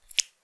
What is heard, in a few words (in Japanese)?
くちゅ音単発